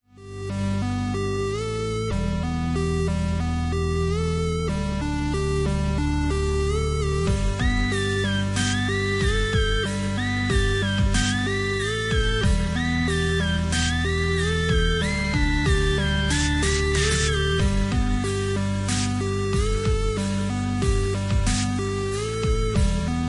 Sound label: BGM 8bit